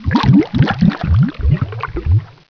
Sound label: bubbles